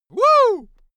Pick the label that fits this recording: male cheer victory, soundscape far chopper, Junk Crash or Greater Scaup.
male cheer victory